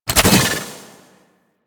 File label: Arachnoid Wraith Melee Whoosh; Warframe; Corpus; Abilities